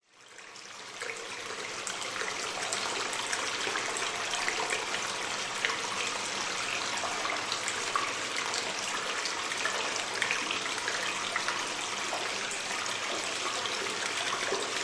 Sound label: nature cave ambience water running dripping stream; zapsplat